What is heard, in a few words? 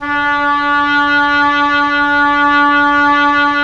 WND OB P, Oboe p; Roland - LA Composer vol. 4